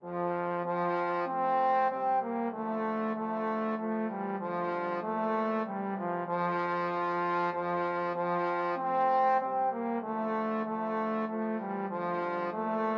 Christmas Hymn trombone trio

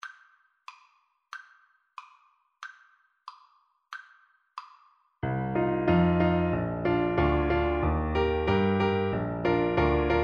Oboe

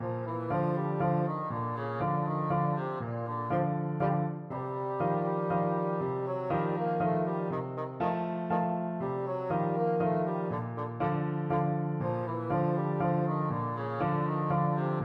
fledermaus, bassoon; strauss

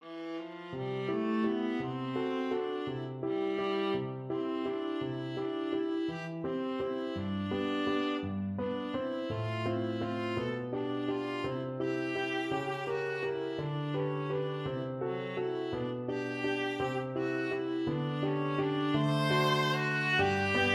tchaik serenade, viola

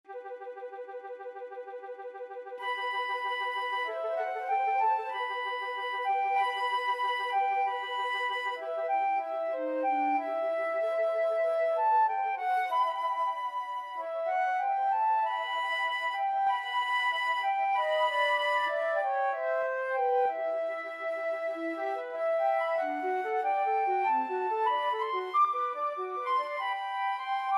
scene finale, flute duet

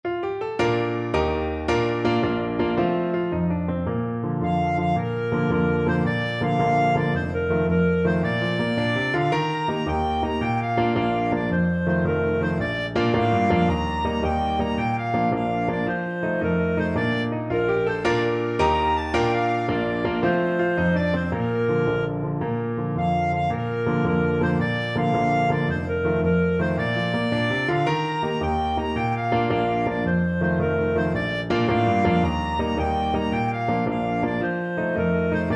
banks of the roses, clarinet

